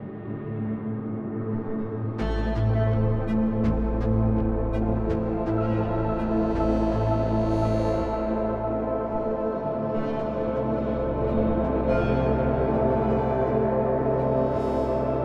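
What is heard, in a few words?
Lab Rat DRONE, video game soundtrack; escape academy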